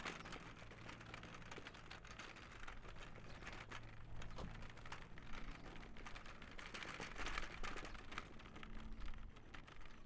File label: Pushing Trolley